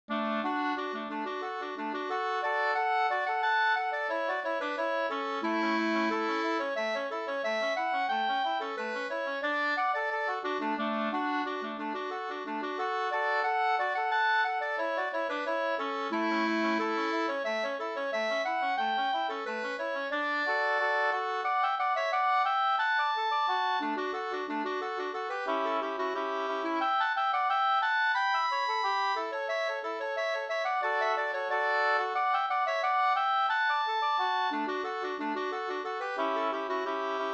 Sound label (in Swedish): ganglat